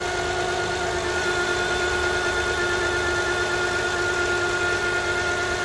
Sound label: engine sound, pilot